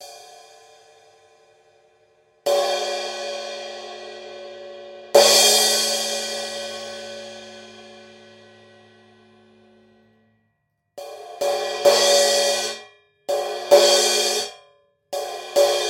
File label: Paiste PST8 Rock Crash